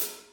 shiny pedal hi hat single hit g sharp

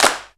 raw clap sound c sharp key; single hits one shots

